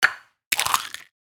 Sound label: Hitting and squeezing an egg; kitchen